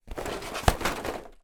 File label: foley box of light plastic items movement